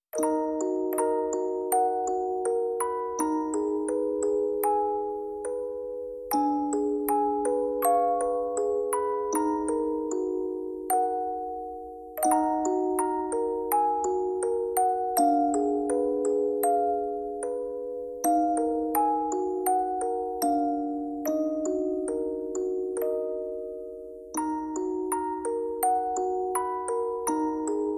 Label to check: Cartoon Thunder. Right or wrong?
Wrong